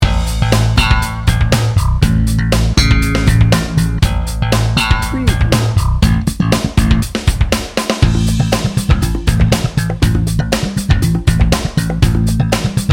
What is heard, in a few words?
its a party